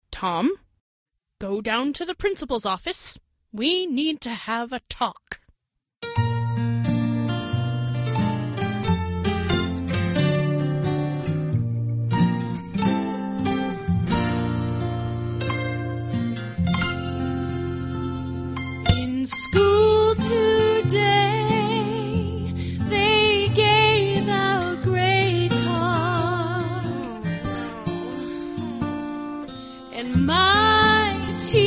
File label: tom